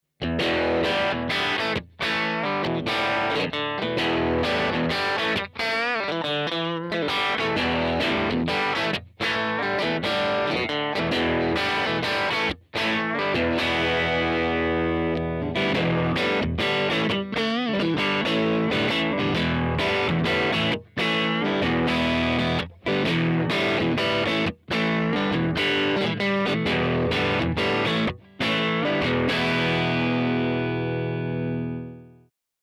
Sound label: Strat